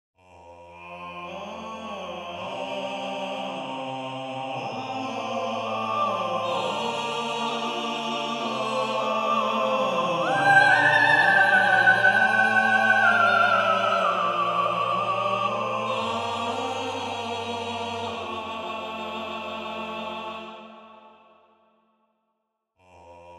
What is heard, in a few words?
counterpoint